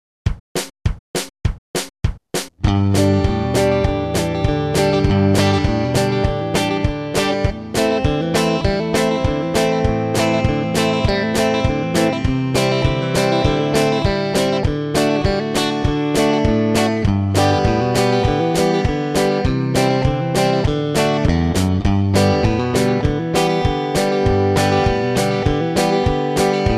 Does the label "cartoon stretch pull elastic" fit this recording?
No